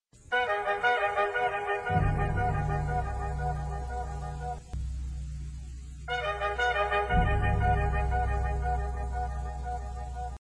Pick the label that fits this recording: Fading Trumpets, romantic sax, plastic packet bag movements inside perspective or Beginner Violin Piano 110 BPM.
Fading Trumpets